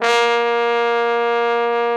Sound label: BRA TROMBO, Trombone; Sound & Vision - Gigapack II CD 1